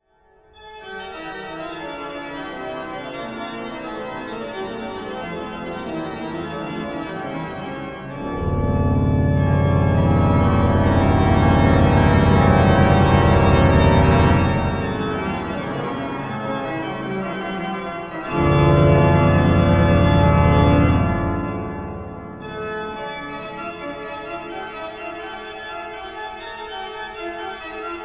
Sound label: Toccata and Fugue in D Minor